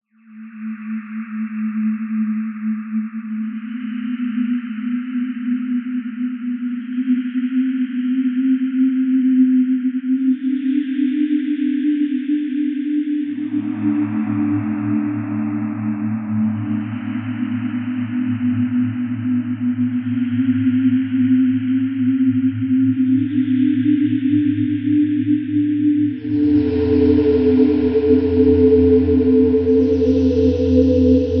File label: sines